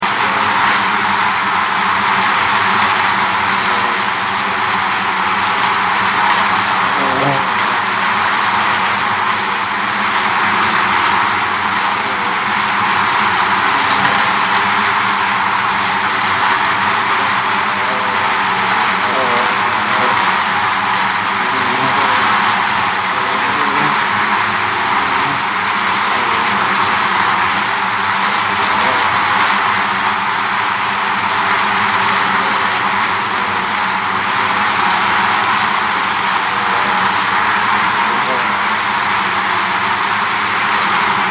Bee Hive Wings, Animal, Bee, Hive, Wings, Buzz, Insects, Bugs; 5.1; Surround Sound; BlastWave